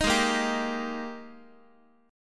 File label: G sharp Major 7th Suspended 2nd, Guitar; JGuitar